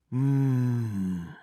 んー…

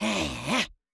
鳴き声

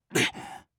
っ…！

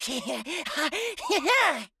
鳴き声

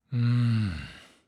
んー…

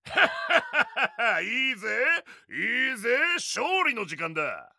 ハッハッハッハッハ！; いいぜぇ！いいぜぇ！勝利の時間だ

